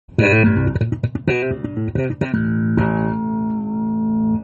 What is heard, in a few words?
Bass